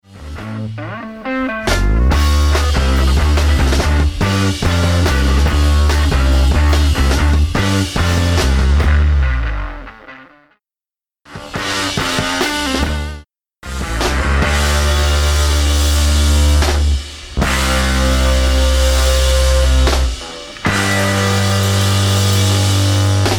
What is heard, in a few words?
Guitar & drums